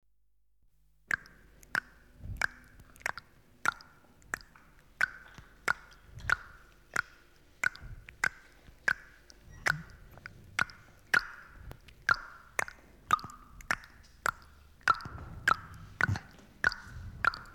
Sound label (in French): claquement langue